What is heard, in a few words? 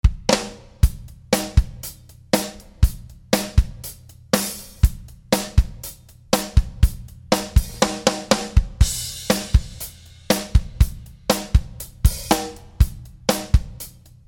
Rock Solid Acoustic Drums